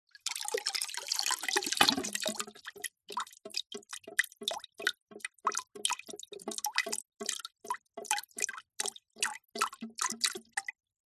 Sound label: industrial pump water drip drain from plastic pipe into plastic bucket